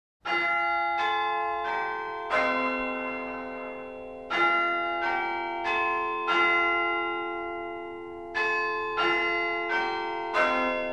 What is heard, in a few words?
School Bell, bell towers; St Pauls